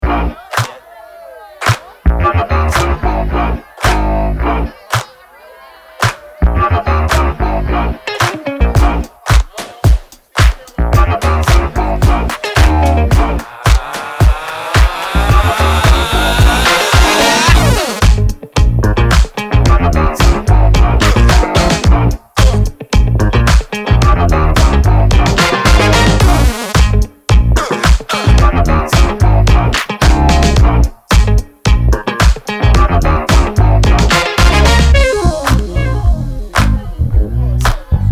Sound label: extreme settings input gain, mastering clipper; lavish